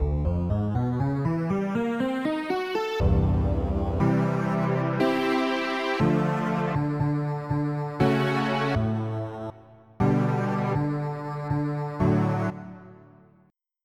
Mistpad; FF7

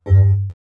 Attention Chime; Waterloo

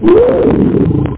cat

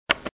single click; FirstClass; VillageSettings 3.80

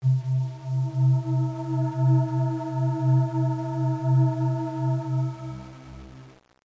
PAD

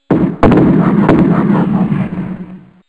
artillary; BOMBER III™ (DEMO)

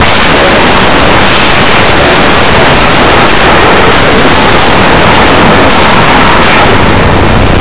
bounce; Blue Heat

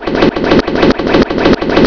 FAN HIT; Terra; TERACIDE

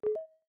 Windows Pop-up Blocked; Windows Server

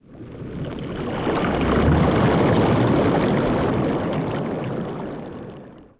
wave overhead; DAoC

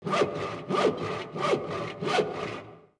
HAND SAW, construction

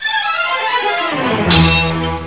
ball lost; Crystal Caliburn

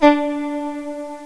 VIOLA

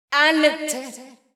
VOICE GRL HOUSE 125 A, House Voice

